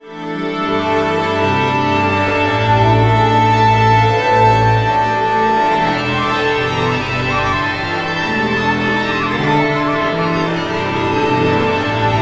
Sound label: Orchestra Tuning